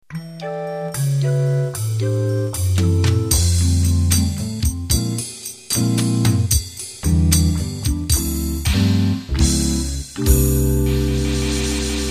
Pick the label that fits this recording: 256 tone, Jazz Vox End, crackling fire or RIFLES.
Jazz Vox End